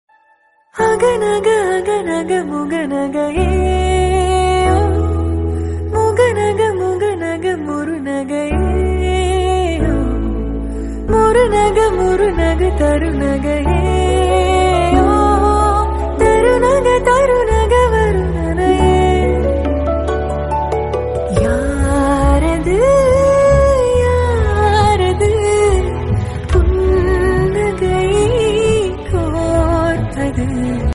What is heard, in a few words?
Tamil Song